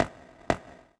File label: Walk; Mara Sailor; Gamigo